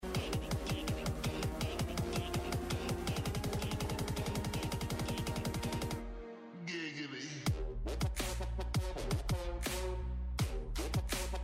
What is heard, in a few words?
Giggity Remix